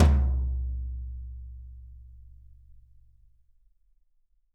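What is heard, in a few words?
YAMAHA 16X, right, drums; Big Fish Audio; Ross Garfield Drums VOL-2; T-YAM BRUSH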